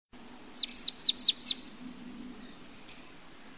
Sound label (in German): vogel